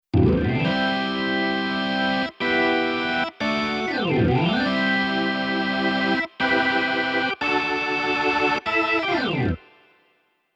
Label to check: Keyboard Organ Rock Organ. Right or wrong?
Right